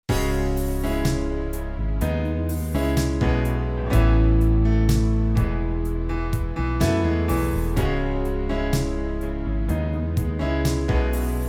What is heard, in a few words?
ballad yamaha